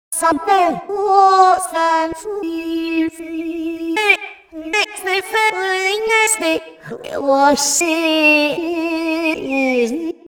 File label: basic alienization